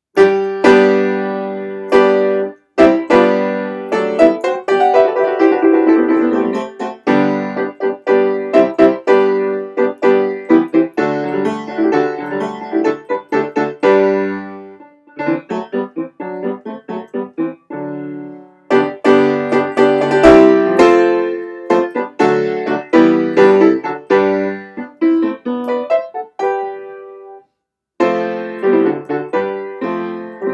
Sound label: improv G maj, piano improvs; “Proverbio”: recollection of the one piece I know by; Orazio Vecchi; Noam D. Elkies piano improvisations